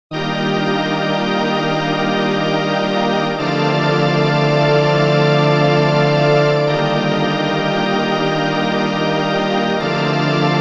logan chords; stringer

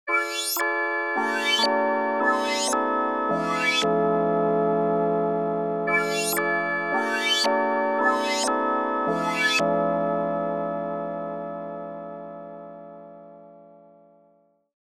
multimode sweeps; opxpro

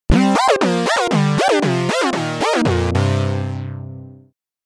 minimoog; opxpro

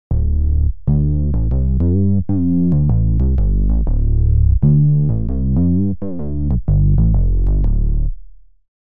Fretless Bass; PRO12; opxpro2

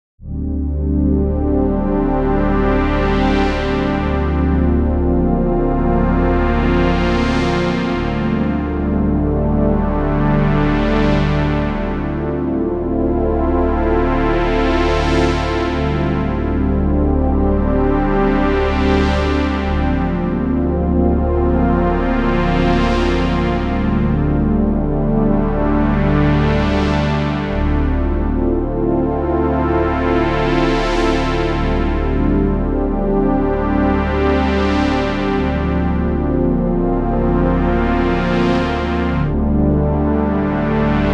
Prophet Upsweeps; opxpro2; Platinum

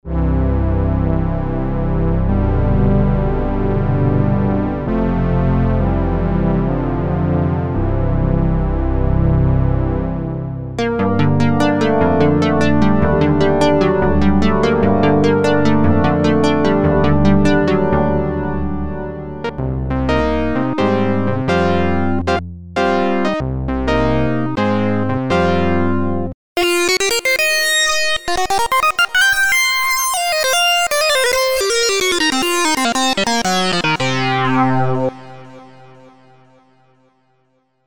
opx prophet 5

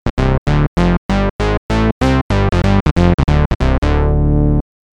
Classic Bass; PRO12; opxpro2

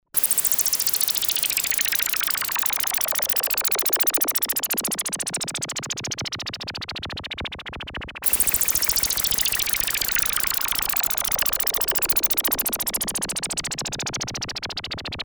fast filter mod

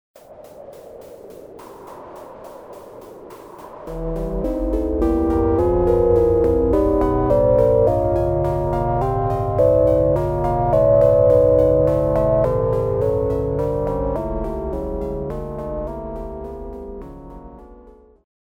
electronic arp; opxpro

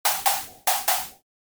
high snare; opxpro

